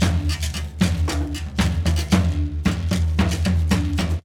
Bag Pipe Groove, Rattle, Brekete, Djembe; Berimbau

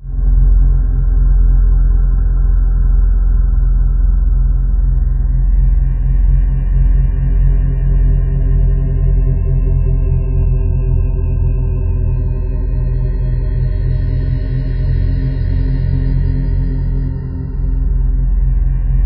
LOW TONES, Ominous Drones; REAKTOR; USB Soundscan vol.48; AKAI

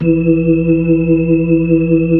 B-3, Brite

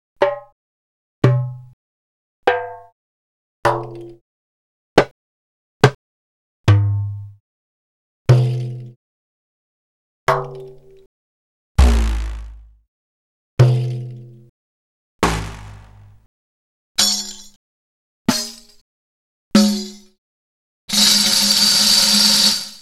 Ethnic, drums percs; X-Static Goldmine

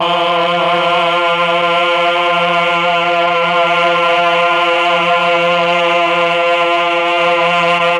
CHOR; ILIO; Propeller Island Legendary M400

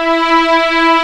E4 LEG MFI L, The Ultimate String Collection; East West

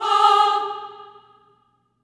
Staccato F G#4 R, Symphony of Voices; Spectrasonics

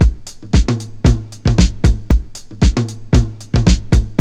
house loop

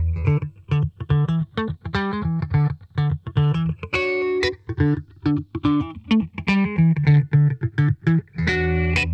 FAT FAZE, Funk Guitar; Zero G - Funk Guitar

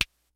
FUNK, DRUMS; Kid Nepro; Elite

